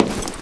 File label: player grate